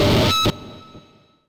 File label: feedback